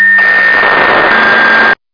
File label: Siren; Hamburg FD